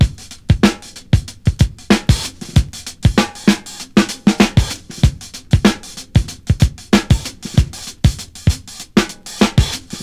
127 bpm drum loop c key, tuned drums c key

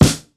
fluffy steel snare drum C sharp key; snare drum samples

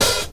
fresh clear open hat d sharp key

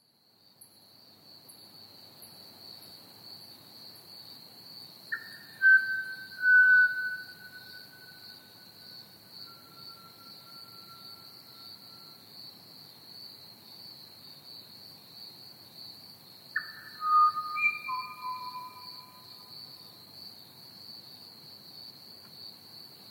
pied butcherbird and crickets chirping

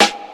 sharp snare drum G key

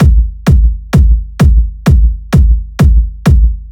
Techno Warm Kick VErby, reverb

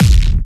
analog distorted mid tail techno one shot kick; kick drum samples

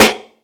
original snare one shot a key; snare-drum-samples

